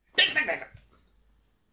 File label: ouch; dolls House; bony